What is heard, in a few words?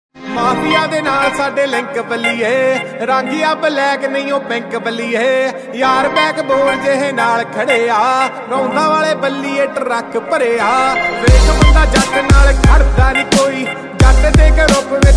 Punjabi Tune Ringtone